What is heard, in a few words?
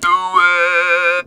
"do it", Talk Box